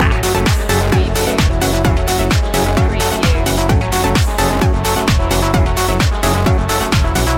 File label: electronic progressions loop